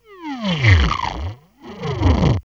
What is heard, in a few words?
FX, Hip Hop R n B; USB Soundscan vol.19; AKAI